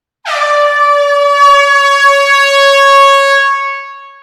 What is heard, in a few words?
air horn in close hall